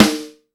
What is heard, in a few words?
Boss DR880 snare; drums